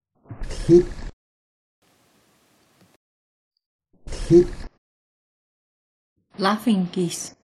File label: laughing geese